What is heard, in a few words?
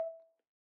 Ocarina Sus E4 rel, Ocarina, Typical, Releases; Aerophones; Edge-blown Aerophones